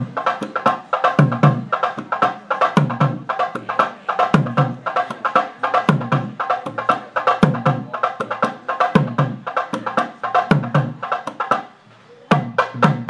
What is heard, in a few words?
drumming; quo vadis tiruvannamalai